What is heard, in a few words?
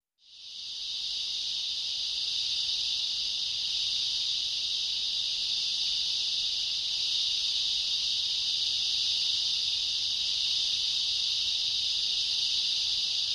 Night Jungle Ambienc, backgrounds